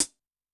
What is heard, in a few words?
HiHat; K13 Clse; Yamaha DTXpress-II